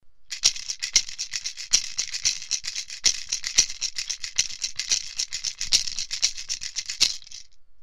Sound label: rattle